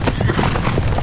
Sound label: horse